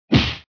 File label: punch